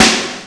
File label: snare, Emu Sp12; Drums